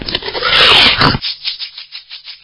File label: poison bite, poisoncrab; svencoop; hl2